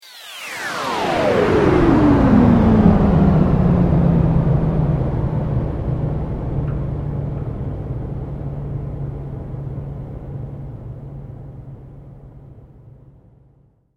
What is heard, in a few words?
Sweep Mechanical Slow